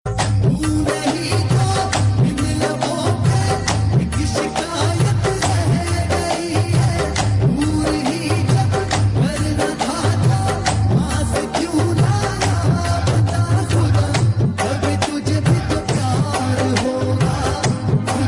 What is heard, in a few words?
Bollywood